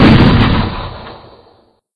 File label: cannon; Factorio; Factorio 0.14.21; fight